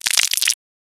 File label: fruit, sound-effects